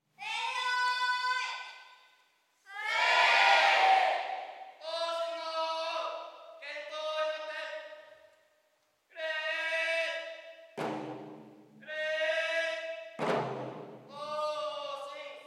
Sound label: yell